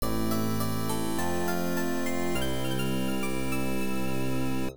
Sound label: Fanfare; the legend of heroes iii white witch; pc 9801; opna